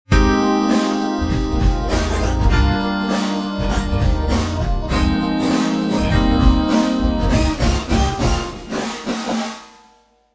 Pop; TSMtoolbox

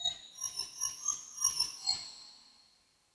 Glockenspiel

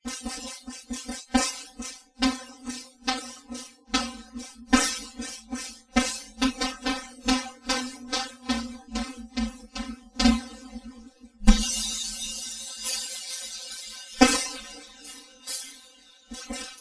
Drum Solo

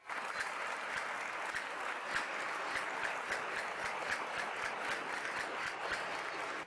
Castanets Violin Applause

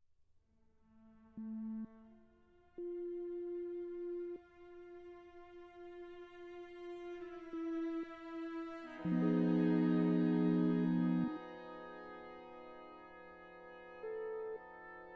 Sync Sine Soni

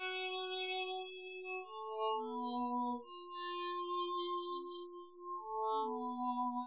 Castanets Violin Applause

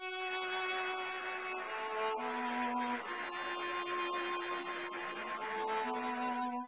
Castanets Violin Applause

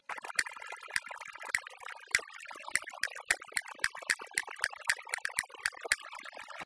Castanets Violin Applause

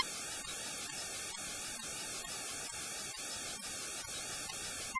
Vibrato Impulses Noise Rem

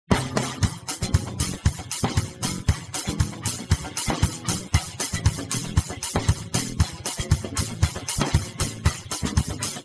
Jazz

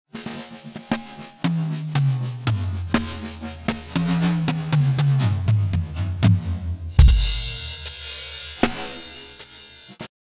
Drum Solo

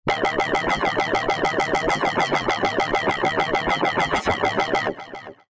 Synth Mono

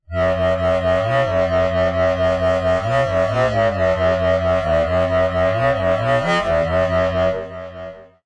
Synth Mono

